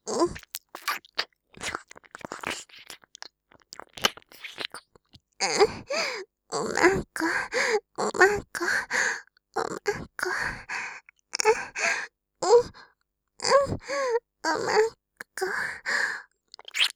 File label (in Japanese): 耳舐め喘ぎ; 右差分; お屋敷メイドのハーレム淫語ヘヴン; バイノーラルオナサポ音声; 由真